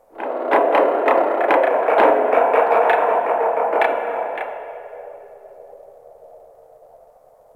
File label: Ocean Underwater Creaks Medium; Warframe; Grineer Ocean